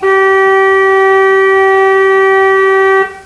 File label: CHANT, limonaire fournier